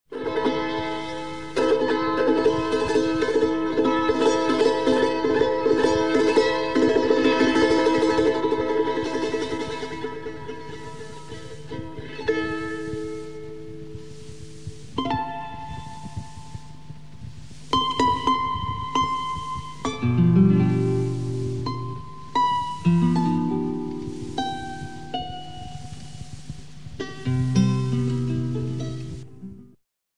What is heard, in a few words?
charango